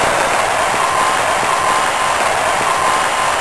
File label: cheer